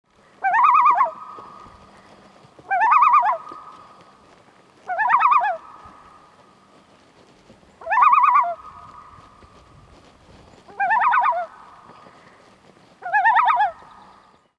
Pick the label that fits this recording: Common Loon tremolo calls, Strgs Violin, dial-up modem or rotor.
Common Loon tremolo calls